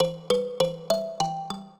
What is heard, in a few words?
trio, mbira; Mozart